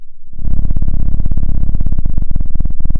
pop, Sound of a cat purring

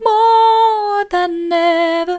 more than ever soft, vocal

